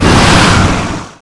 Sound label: dragon shout short